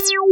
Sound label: TECH REZ BS; Pro-Rec - Synthsonic; BASSES